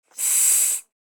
Inflatable toy inflation single blow

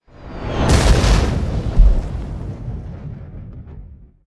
Incoming missile large explosion

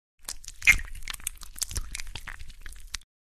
Slimy liquid movement squelches

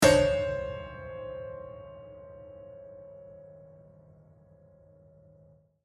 Haunted piano string hit horror

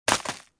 Small garbage bag objects throwing away dumpster; household

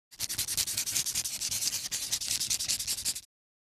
Shoji paper handwriting pen scribble

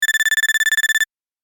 Old cellphone ringing single

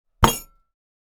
Linear steel table hit impact plates glasses

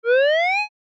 Line graph rising animation infographics

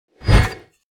Pickaxe launch no hit

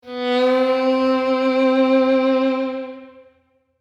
Violin slur

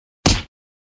Punch medium body hit

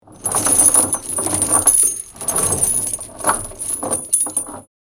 Chains dragging on wood

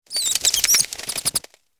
Bat squeak chirping close perspective

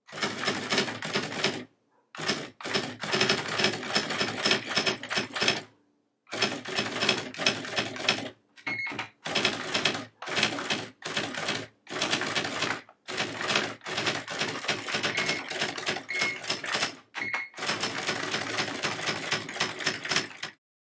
IBM Selectric electric typewriter typing

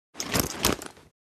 Military equipment movement; military equipment sound effects

